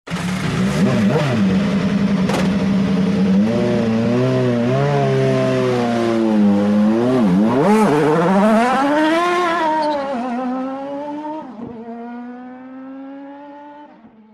Motogp Sound

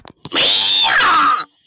REEE HAW

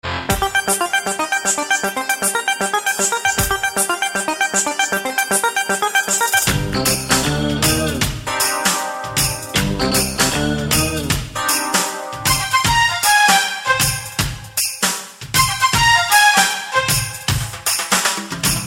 Romance Beat

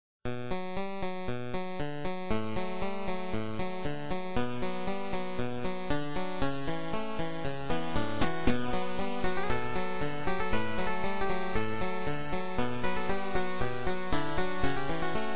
8bit

